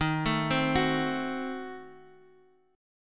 A Diminished 7th D sharp, chord shape

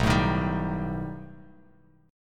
B Augmented 7th B down Bass Standard; strummed; JGuitar

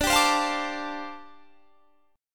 G 7th Suspended 2nd Suspended 4th D

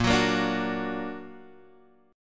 Bb Augmented Bb Guitar Half Step Down, chord

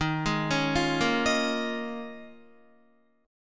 Eb 7th Suspended 2nd Suspended 4th down Guitar; Eb7sus2sus4; arpeggiated; JGuitar

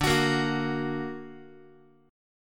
D Augmented D down Guitar; D+ strummed; JGuitar

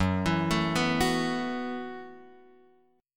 F sharp Minor 11th, Guitar, Open F; arpeggiated; JGuitar

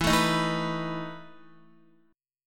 E Major 7th Suspended 4th E down Guitar; strummed; JGuitar

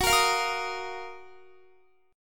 Gb Major 13th, down, Guitar; GbM13 strummed; JGuitar

